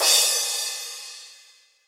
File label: long room reverb crash cymbal single shot d key, Royality free crash cymbal; drum sample